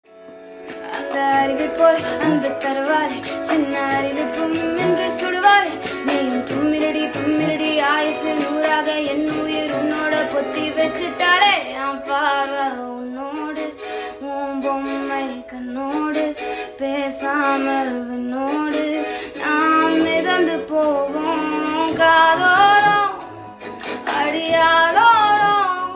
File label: Ringtone Female Version